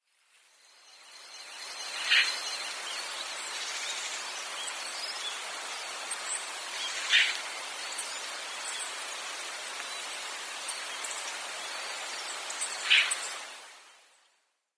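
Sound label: Lesser Whitethroat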